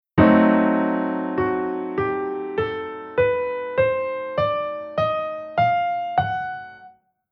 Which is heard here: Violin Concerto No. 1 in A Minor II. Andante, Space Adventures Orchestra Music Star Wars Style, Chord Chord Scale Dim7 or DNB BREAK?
Chord Chord Scale Dim7